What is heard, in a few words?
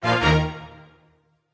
Battle Strike